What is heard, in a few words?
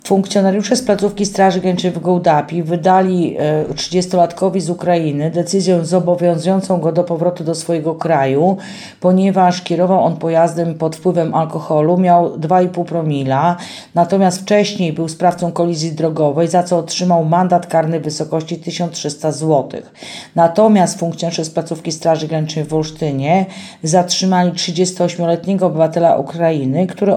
funk